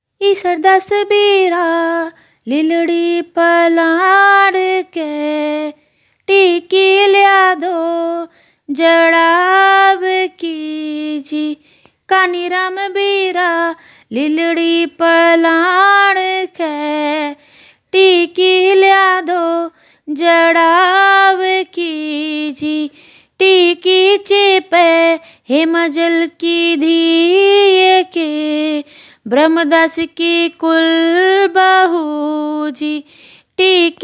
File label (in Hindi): टिक्की ल्यादो, गीत; माटी ल्यान का गीत; गनगोर गीत